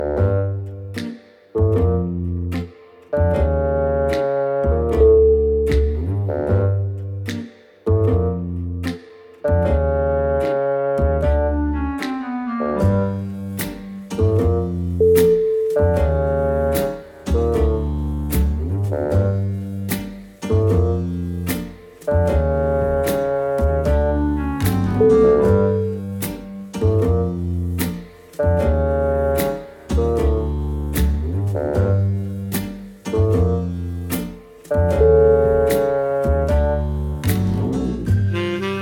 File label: spy black jazzy